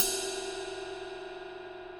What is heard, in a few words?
22 inch medium ride ping, cymbal; vault artisan; Sabian